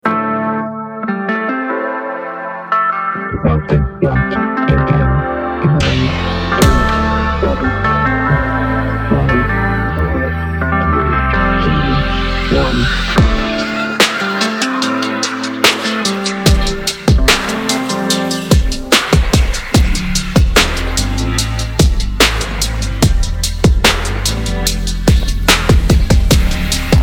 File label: instrumental